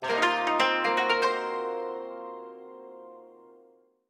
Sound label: puzzle Solved; B Path of the Teal Lotus